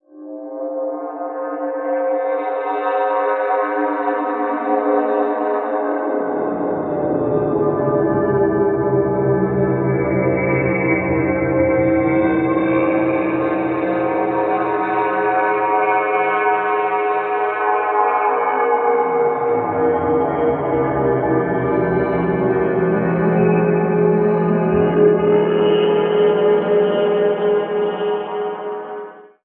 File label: texture whole tone space, One Shot Textures; Transmission-X